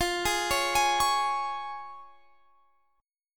C sharp Major F

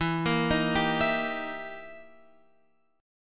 E Minor Major 7th Flat 5th, E

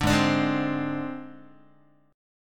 G sharp Major Flat 5th, Guitar

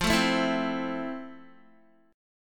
F Major 7th, Guitar; strummed

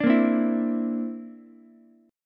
D 7th Suspended 2nd Sharp 5th, Ukulele; strummed; JGuitar

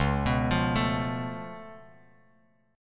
Db Augmented 7th Db down Bass Standard; arpeggiated; JGuitar

